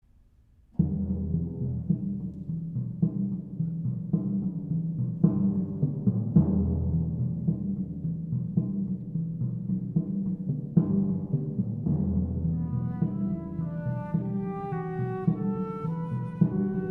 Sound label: Double Timpani Concerto